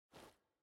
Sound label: Foley Gear